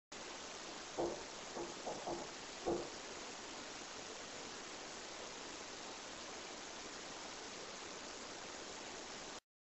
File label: tool room response to shave and a hair cut